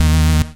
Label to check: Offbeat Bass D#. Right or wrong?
Right